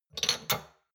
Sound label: shot glasses grab multiple soft, gastronomie; berufe; soundpool